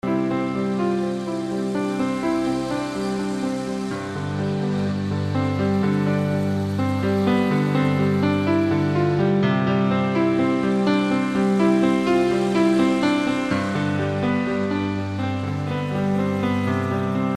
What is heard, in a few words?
music